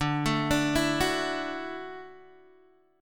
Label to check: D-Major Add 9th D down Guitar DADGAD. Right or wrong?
Right